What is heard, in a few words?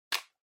Handheld Transceiver Jack Unplug